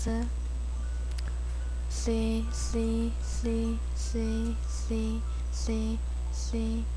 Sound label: sea